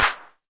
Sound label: clap, Roland CR-8000; DRUM MACHINES